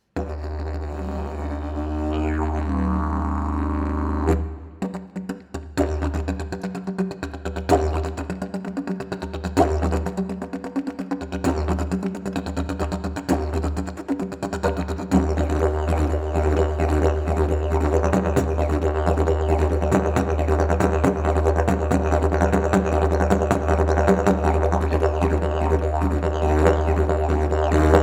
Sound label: didge